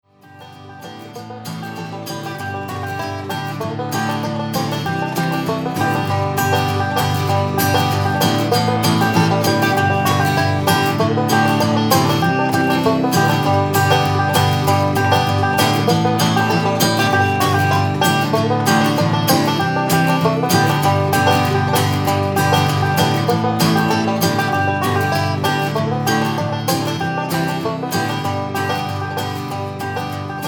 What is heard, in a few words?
banjo instrumental